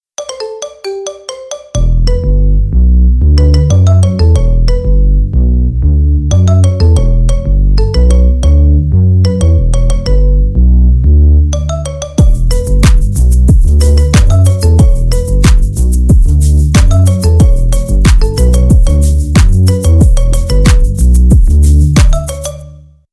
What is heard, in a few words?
marimba remix